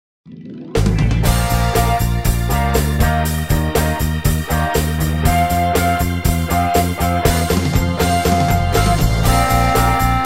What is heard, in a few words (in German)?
klarinettentaxi